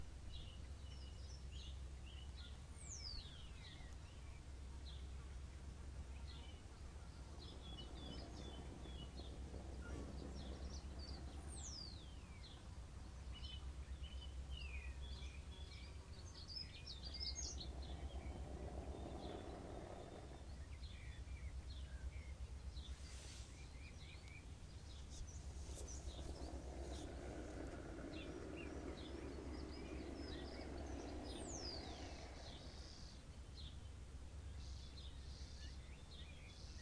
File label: ambient bay song